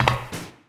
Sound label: Road Walking Near Border; finn's sounds